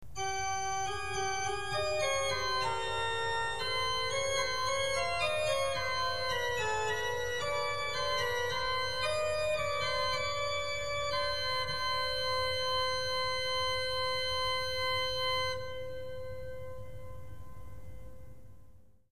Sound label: scharf